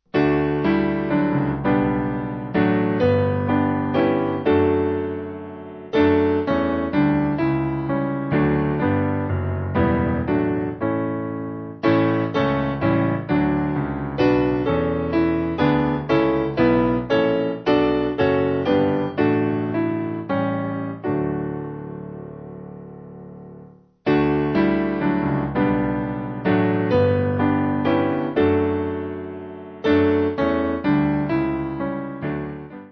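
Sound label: Piano, Music Backing Files for Churches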